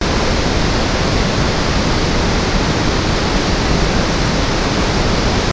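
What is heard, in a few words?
sound of a big waterfall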